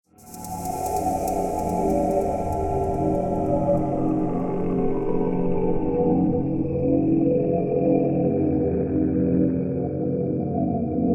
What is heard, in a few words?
Eerie Groan